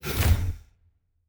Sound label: Door, Sci-Fi Sounds